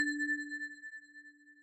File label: match confirm; Luxariah Default Minimal